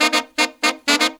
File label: Pop & Funk Brass